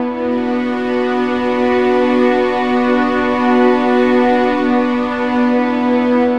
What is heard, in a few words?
PAD